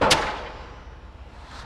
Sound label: Pile Driver Loop